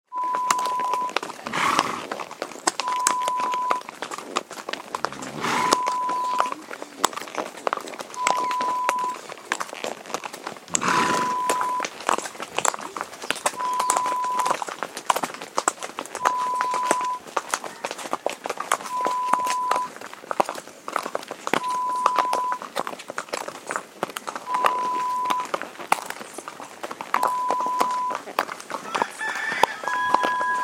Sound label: Horse riding sound